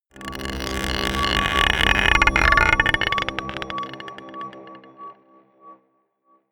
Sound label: scrapes marble pot dark, drones